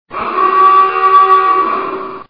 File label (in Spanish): Sirena